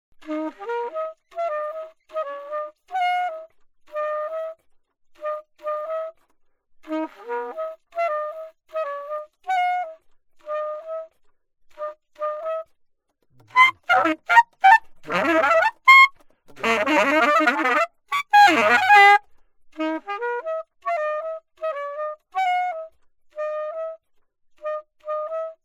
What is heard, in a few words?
altosax